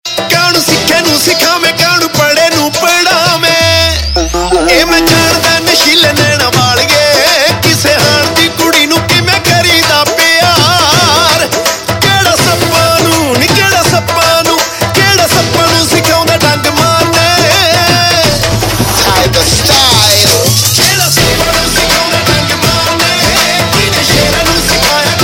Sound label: Ringtone, Punjabi Pop